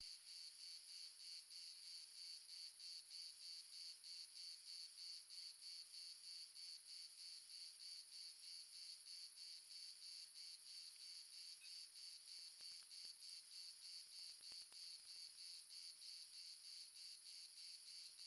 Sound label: amb crickets multiple south france; sonniss gdc